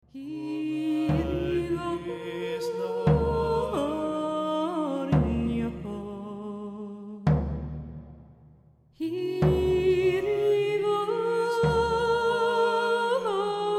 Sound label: celtic music